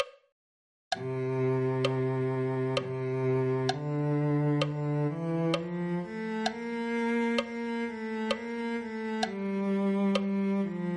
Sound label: cello